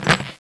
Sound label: charge start; balrog9